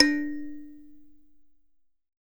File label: THUMB PNO, Kalimbas; E-MU Producer Series Vol. 7 – Old World Instruments